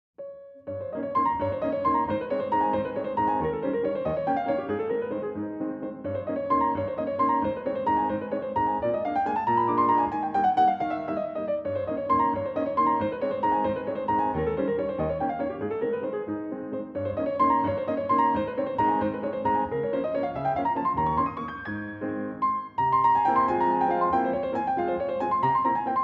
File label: Waltz in A-flat Major, Steinway D-274; Chopin. Waltzes; Steinway D-274, 2013, Serial number 595450